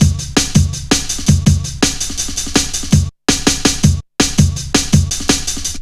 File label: 165, Drum Loops, Drum & Bass; Total Drum&Bass